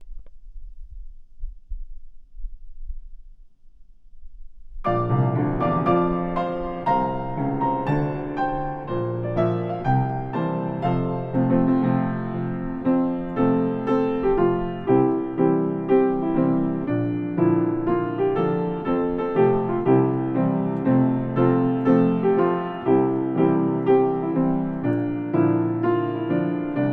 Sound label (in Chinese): 木中校歌純鋼琴版